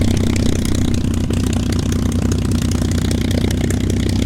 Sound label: mustang rear, engines; War Thunder